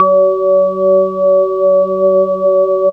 Blue Org; instrumente

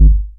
Hard Blow, baesse

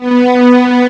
Double String mid; instrumente

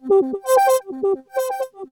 Flute Octaver; instrumente

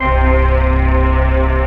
dance chord

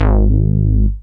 303 Line, baesse

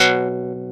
Harpsi Synth 5th; instrumente

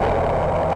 Boot, FX Effect Sounds

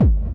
TR 909 & Reverse Reverb, percussion

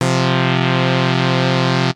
analog

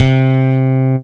Mid Tone, baesse

